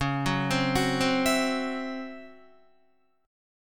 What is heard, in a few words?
Db Minor Major 7th Double Flat 5th Db down Guitar; arpeggiated; DbmM7bb5; JGuitar